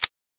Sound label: grenade pull; combat